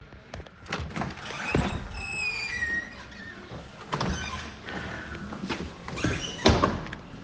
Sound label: office door opens